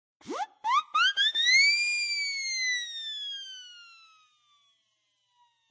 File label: press siren